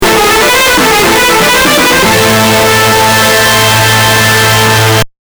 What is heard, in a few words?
Epic, stingers